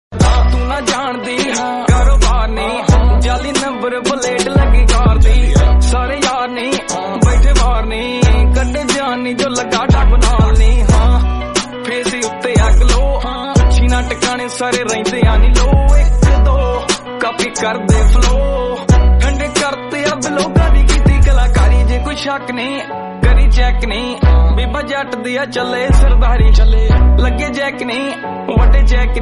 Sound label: Song